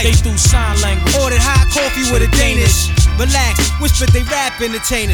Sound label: music; wu xing; earth